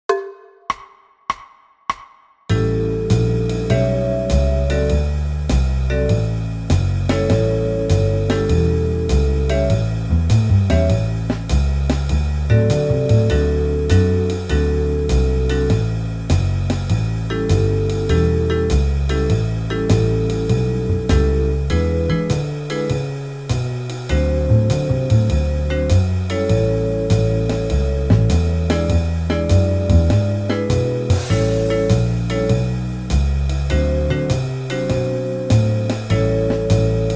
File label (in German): 12 Takt Blues A 100; Play-A-Longs